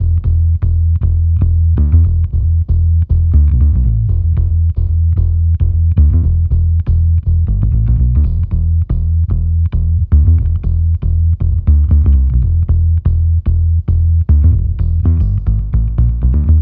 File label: Synth loop 115 E, Synth Bass Loops; Slate Digital BASSHOLE Sample Pack